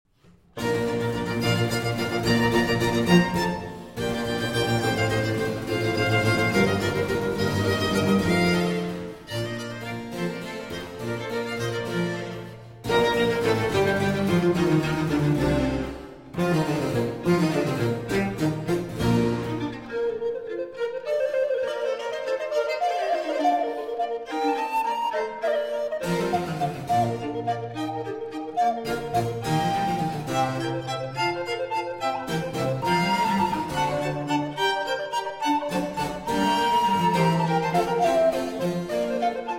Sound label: Concerto For Recorder, 2 Violins and Basso Continuo in C Major; III. Allegro